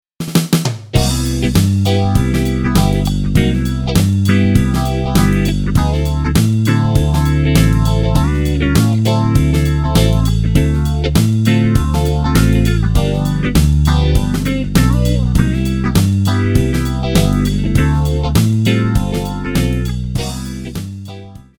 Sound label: patch demo